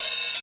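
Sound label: hi-hat open; Best Service; Hyper Dance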